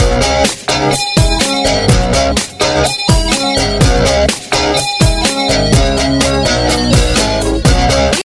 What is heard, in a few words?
Tamil Ringtone